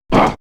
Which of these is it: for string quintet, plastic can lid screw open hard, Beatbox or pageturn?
Beatbox